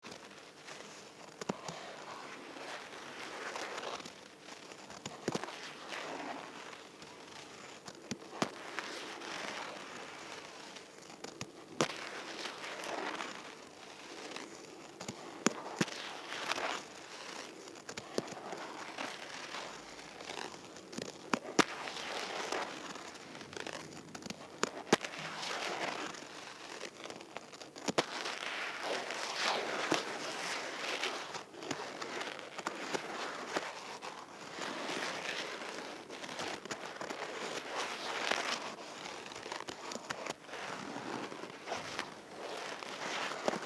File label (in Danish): Lang knipling, contact sounds